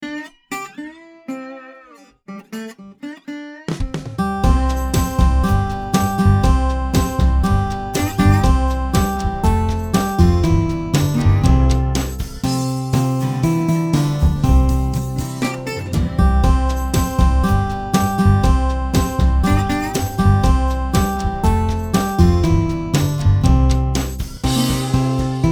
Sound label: Barnyard Song, ukulele in c